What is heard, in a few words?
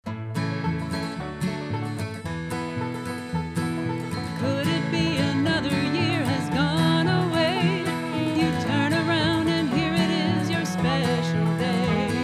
HappyBirthday Long Song